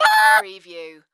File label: Dinosaur Terradactyl